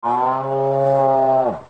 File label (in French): meuh